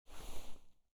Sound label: AK47 Holster; zps; Weapons; Firearms